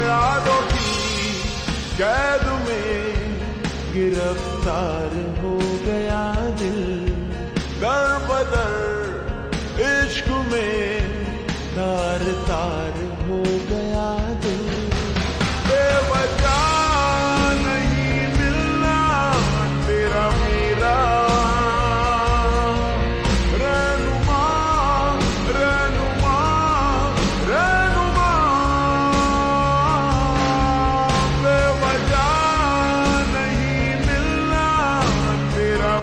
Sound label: Slowed Reverb